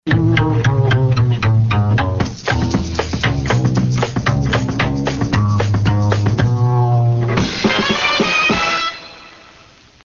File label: instrumental; 1230 wcol; aircheck